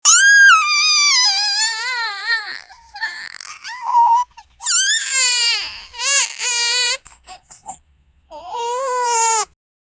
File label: baby crying sound; netflix; tv